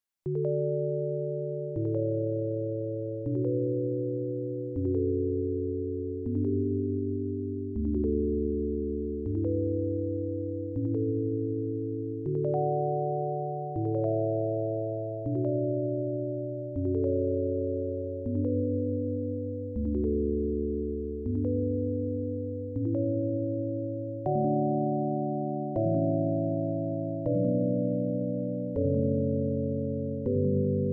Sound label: kanon bell; pachelbel